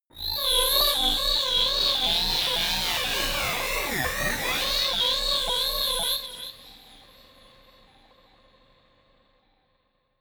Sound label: growling ghost crazy, sciene fiction